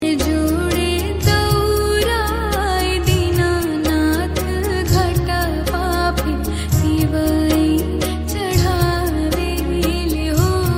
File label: chhath Puja